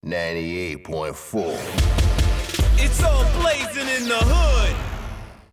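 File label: radio jingle; The 'Hood 98.4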